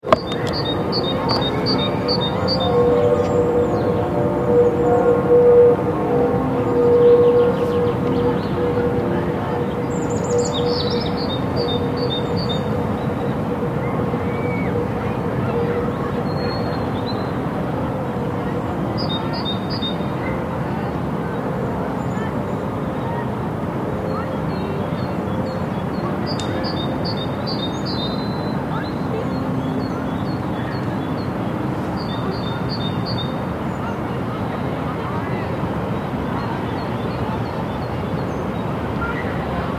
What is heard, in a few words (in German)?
klang uhlandshöhe